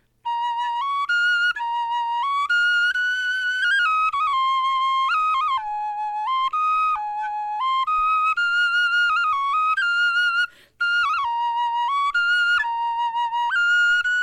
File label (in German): melodie